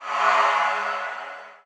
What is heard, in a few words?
Bulging Start Scream; Hollow Knight